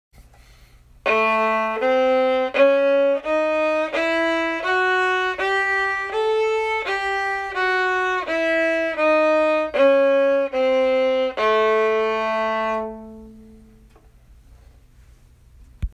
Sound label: sirba scale low octave